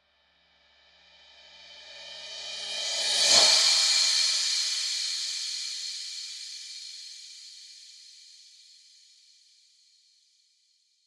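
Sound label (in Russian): звук в обратном направлении восходящий мягкий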